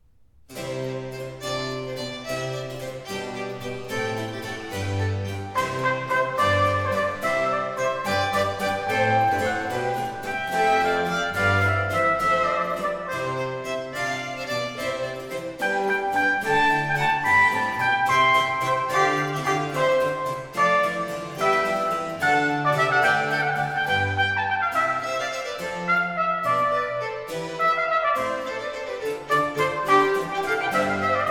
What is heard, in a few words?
Sonate a Quattro in D major - 5. Allegro; Arcangelo Corelli; Corelli - Complete Works; Klassika